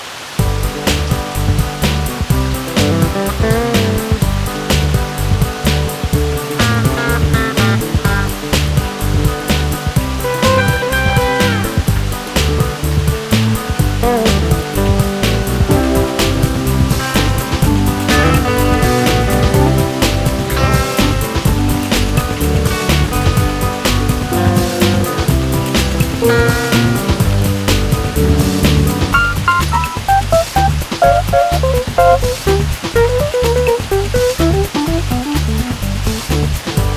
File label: Bike Theme (Heavy Rain); barnyard; playstation 2